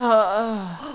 sniped, player female

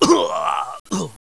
shot, player male

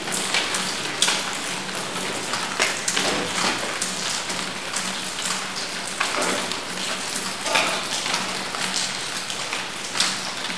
sewer

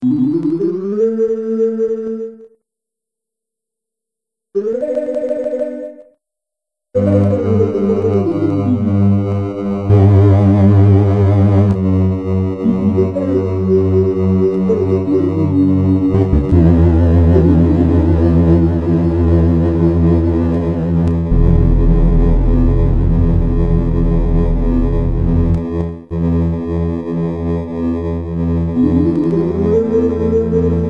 computer; shadowrun

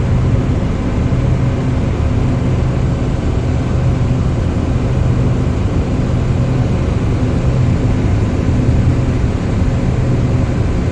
generator

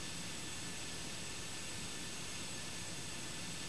ambient steam; chaoscity

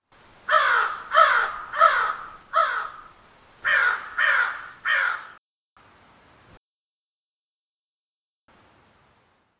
crow

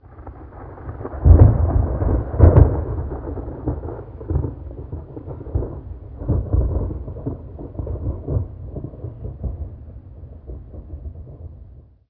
far thunder

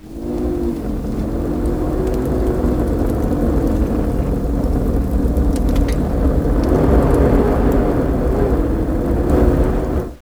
howl wind

